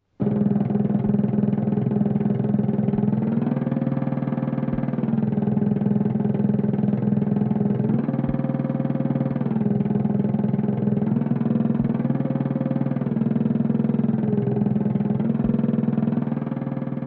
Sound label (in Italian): ronzatore; russolo luigi